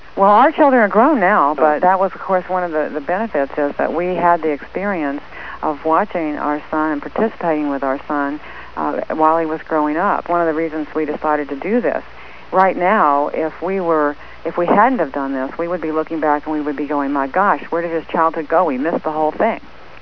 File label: children